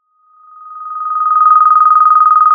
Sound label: Sound of a phone ringing